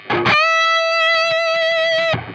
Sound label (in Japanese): チョーキング